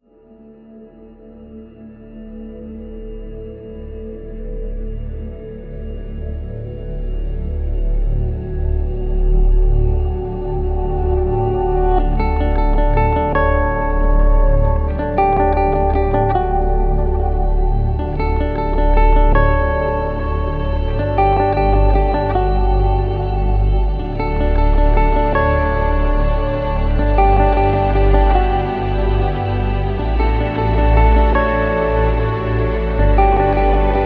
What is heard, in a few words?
Atmospheric Guitars